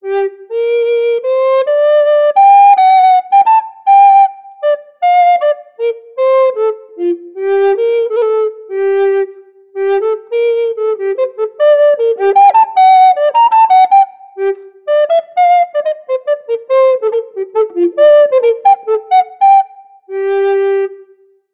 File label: Yamaha YRA302 BIII Alto Recorder; FluteShop